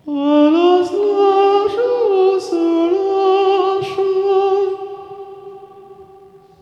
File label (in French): JINGLE, chant eglise